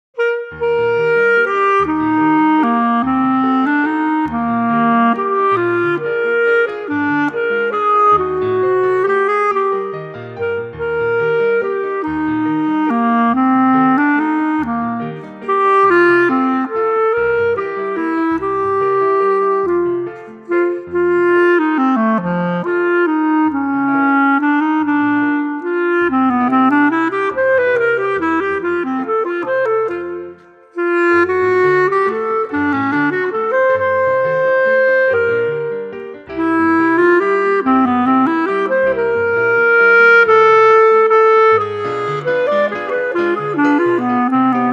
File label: clarinet; Clarinet (and Piano)